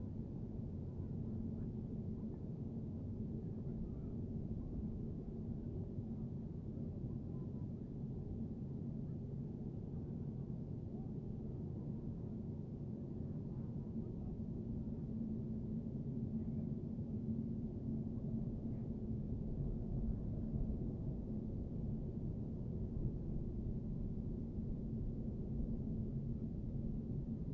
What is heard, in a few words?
amb dinners indoor